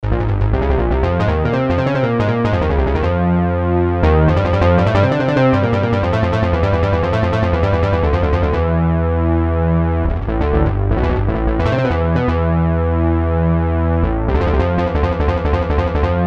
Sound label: roland juno 106 fat bass; bassesland virtual bass vst plugin by syntheway; vst for windows